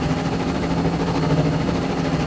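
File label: Ship steady loop, vehicles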